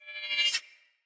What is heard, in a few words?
Long Sword Whoosh Accent Heavy; Weapons; Player; Swords; Pothia